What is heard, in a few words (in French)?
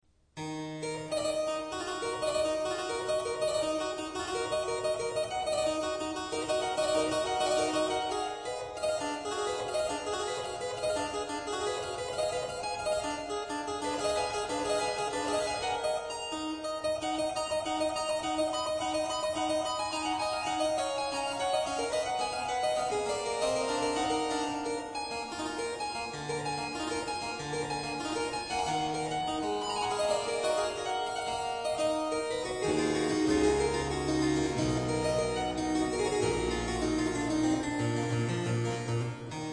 oiseaux harpsichord; rameau